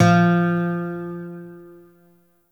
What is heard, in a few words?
Shawzin Oct One F; Warframe; Lotus Shawzin